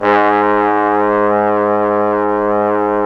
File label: BRS FF BON, Bone Sec.1